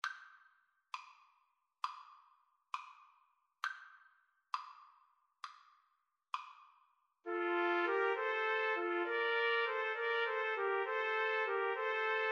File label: clarinet 2 Trumpets Trombone; pastorale